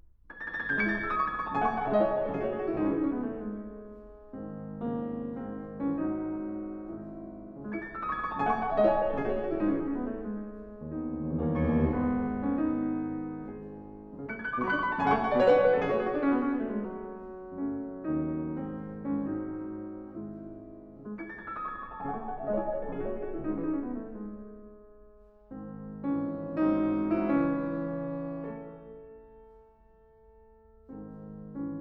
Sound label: C-sharp minor: Allegro molto, piano; 24 Préludes, op. 28 no. 10